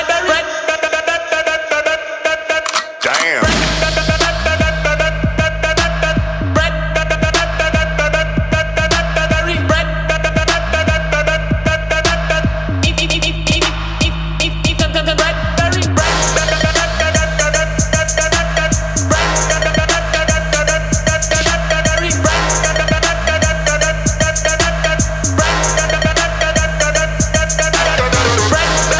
Pop

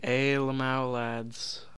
Ayy

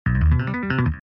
Faceless Bass

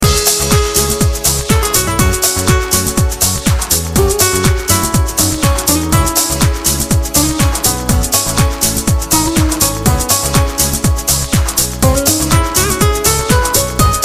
Cool Guitar

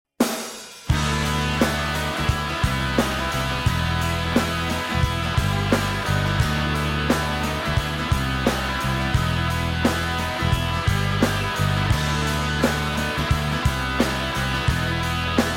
instrumental introduction

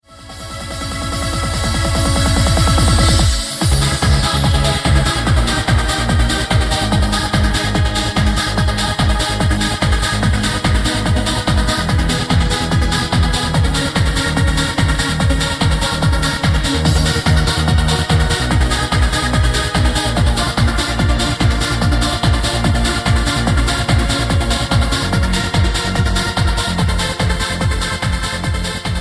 classic trance, ringtones

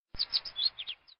twitter bird

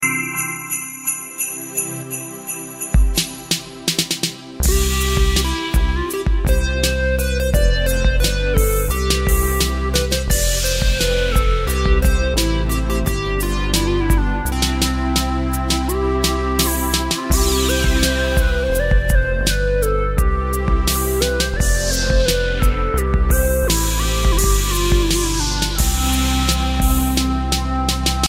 Frosty hiphop

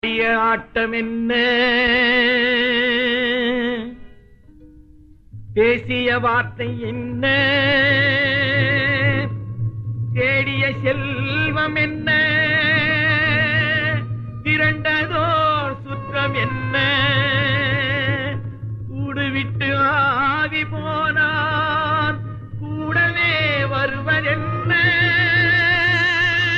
tamil old ringtone; classical

